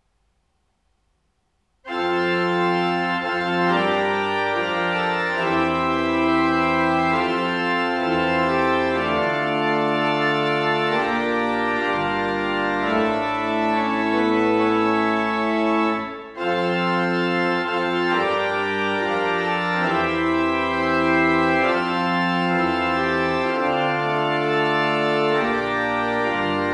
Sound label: Fanfare, orgelet; Torvastad Kirke